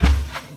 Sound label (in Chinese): 牛脚步声